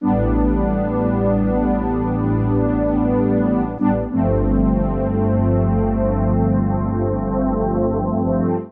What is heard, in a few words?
Soundtrack (G) 110bpm; Music Loops; Ableton; Factory Packs; Singularities